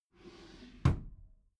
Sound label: desk drawer close regular